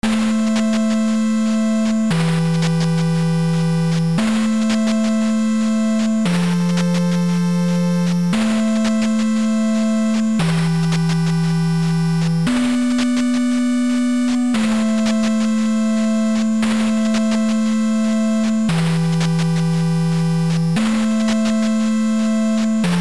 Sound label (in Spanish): alarma